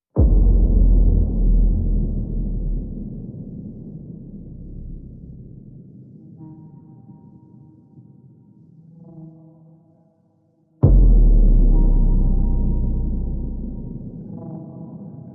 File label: No Vocals